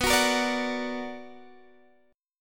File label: B Minor Major 7th, Guitar, Standard; BmM7; x,14,16,15,15,14; strummed; capo=2